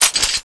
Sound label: mg sm clip in, weapons